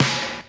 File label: snare sample c sharp key, Loudest frequency: 2378Hz; hits one shots